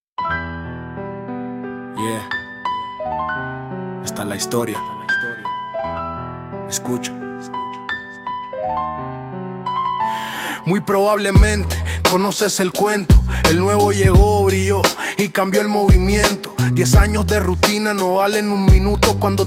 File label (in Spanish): RAP